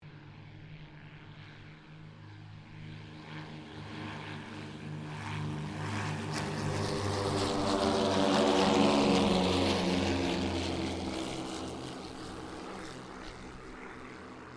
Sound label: CT4 squadron 5 takeoff, Squadron of 5 CT4s; Airplanes; Vehicles